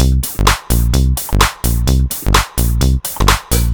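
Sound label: 128 bpm no bass drum, combi loops, nu dance electro; Zero-G; Soundclash; Monofonic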